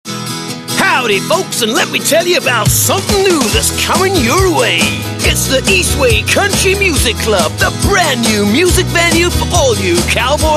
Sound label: Jingle; Eastway Country Club